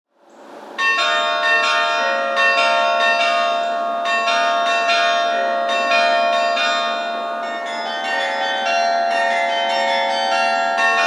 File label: Gallop; carillon; Scott has composed and arranged extensively for the carillon